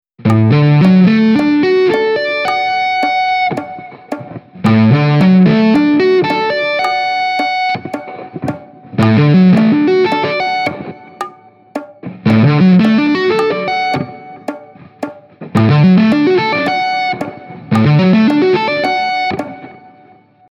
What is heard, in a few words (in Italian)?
Rivolto ascendente